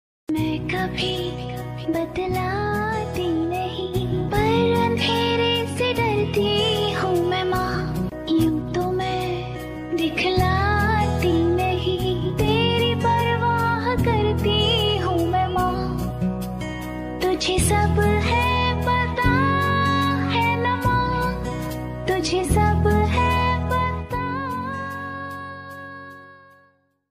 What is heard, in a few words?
Female version Ringtone